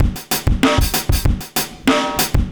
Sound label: Silly Walk, Drum Loops, Distorted; Zero-G Smoke